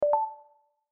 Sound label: notification